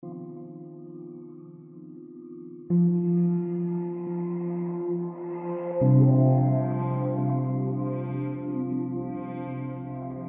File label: WAVESTATE